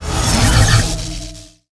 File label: Chain Lightning; Seer; GamigoNA